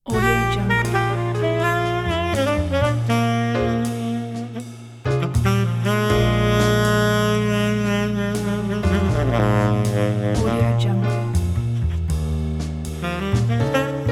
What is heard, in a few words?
lazy sax slow blues